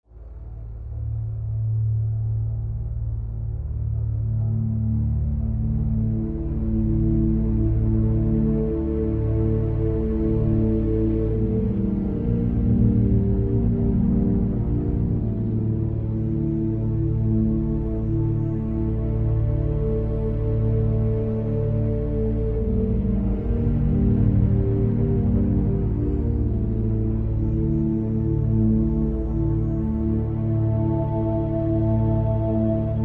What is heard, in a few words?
Ominous; the walking dead season one